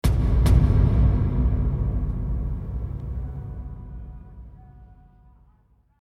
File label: Drum Sound; Millennium